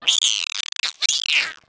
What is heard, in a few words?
egg fling; player; Portal 2; eggbot